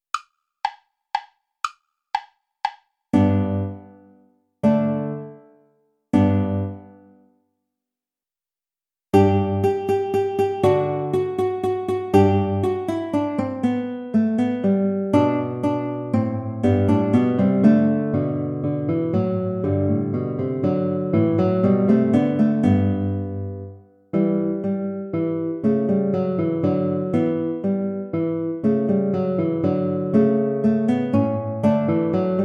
play along without violin; old hungarian dance

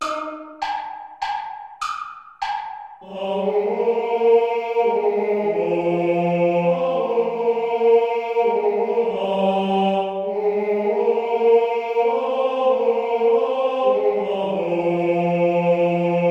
play along only tenor; now the sun declining rays

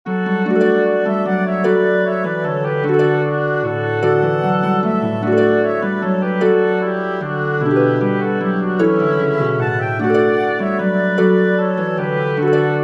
for flute oboe harp; turlough carolan lord inchiquin

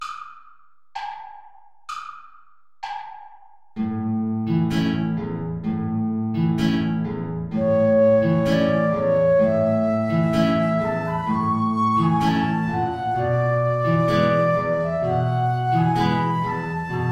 tango play along without flute; albeniz isaac

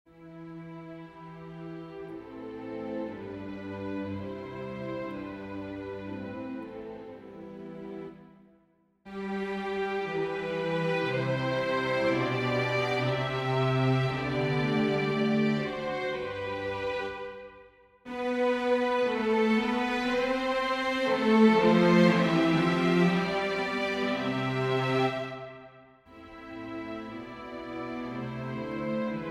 string quartet; bruckner joseph anton; angelum custodem

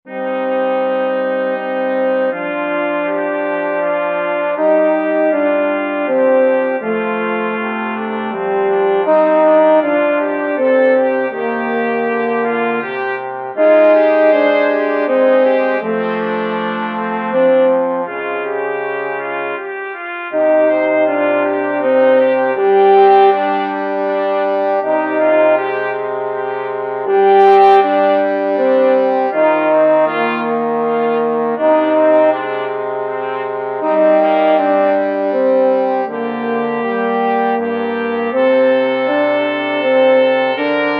trio for horn trumpet and trombone; iii canto

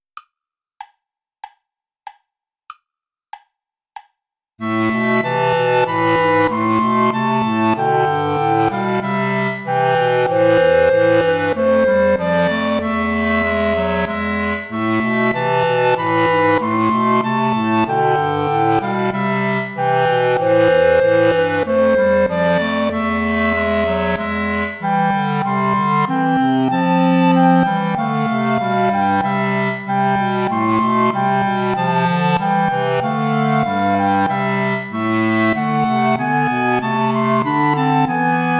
play along without clarinet; bach johann sebastian; ich steh deiner krippen hier

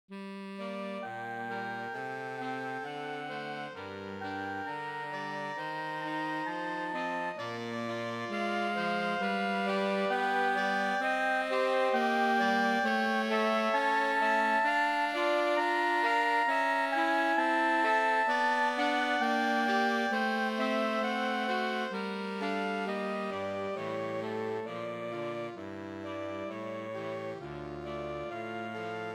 prelude sonate; martini giovanni battista

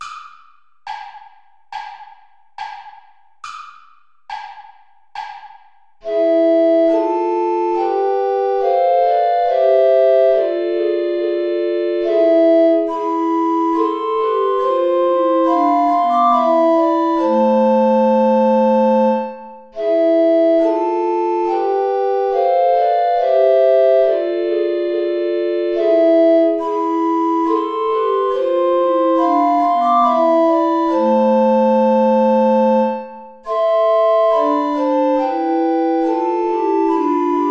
play along without alto recorder; bach johann sebastian; wie soll ich dich empfangen

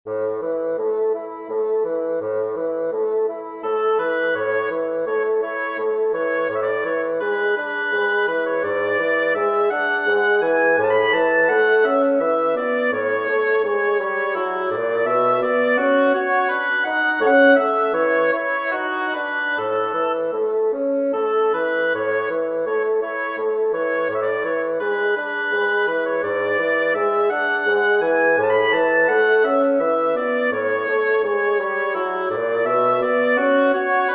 for oboe bassoon; burgma ller johann friedrich franz lay the gondolier from tudes

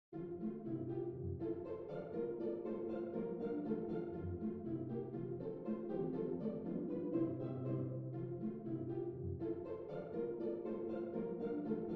pizzicato

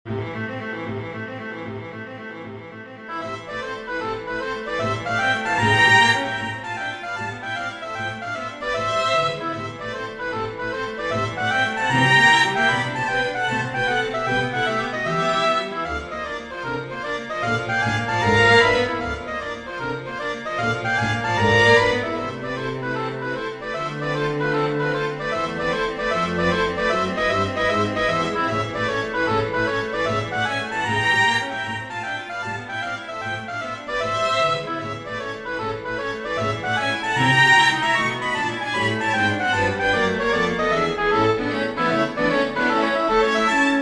scherzo for oboe strings; bizet georges; les chevaux bois; from jeux 039 enfants

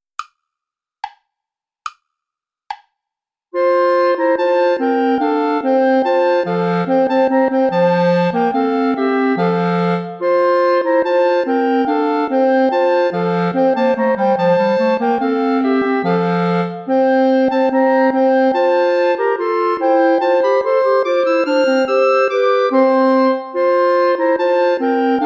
play along without clarinet; schma ckt den saal mit gra nen zweigen

